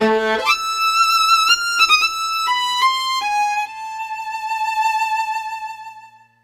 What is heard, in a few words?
Fight Reconciliation Failure